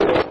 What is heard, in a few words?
engine damage